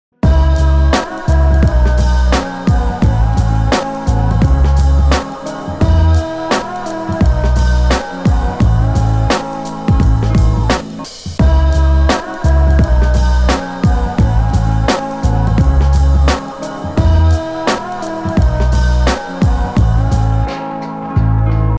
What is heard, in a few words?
Ringtone Mobile